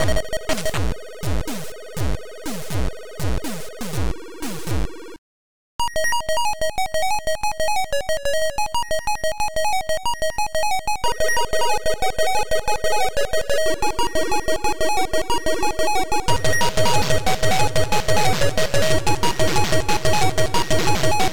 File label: Techno